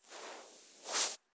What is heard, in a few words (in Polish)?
wiszacy pajak chwytanie